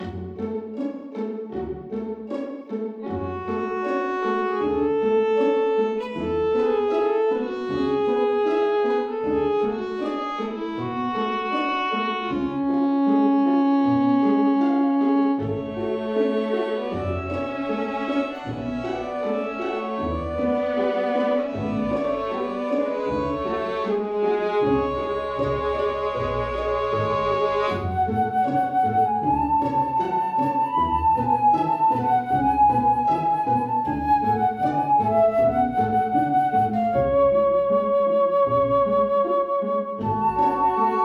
pavane

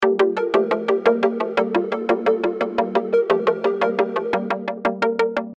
peaceful loop synths

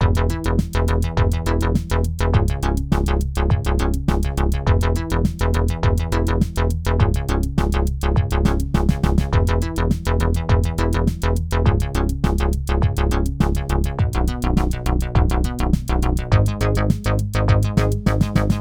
breeze bass ghetto kit

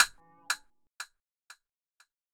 astro castanets

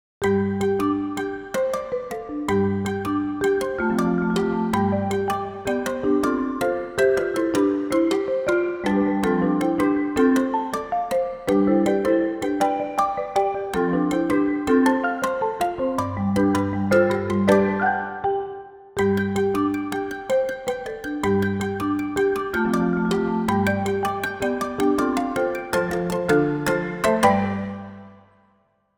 easy marimba

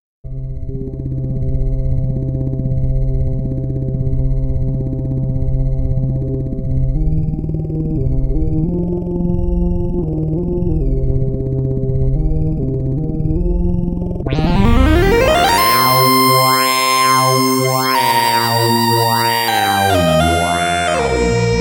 PPG wave generator, pop; shanghai

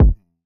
enigmatic kick

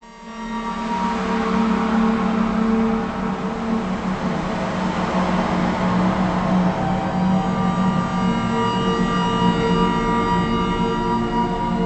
haunting drone; semiramis

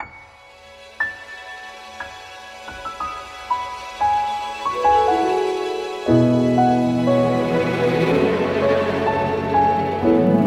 indie

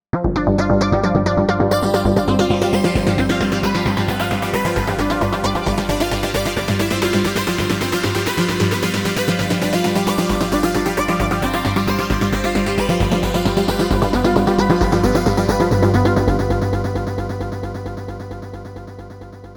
porta arpeggio phased; opxpro3

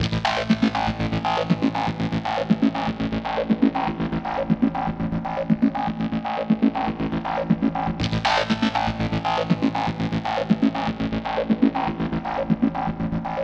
bass loop a 120 bpm

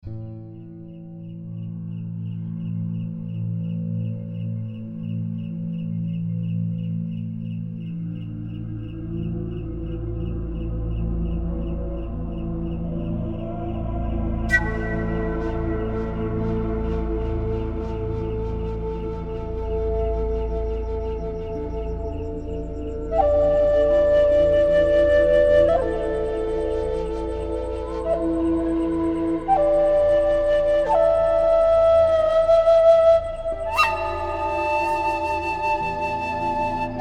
forest kingdom night spirits

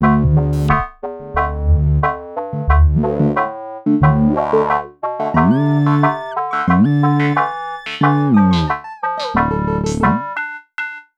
synthipop musak

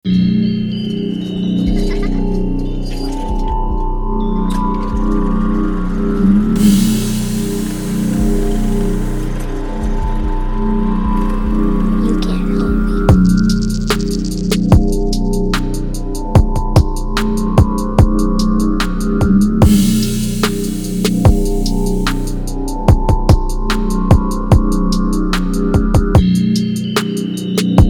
horror; horror worx

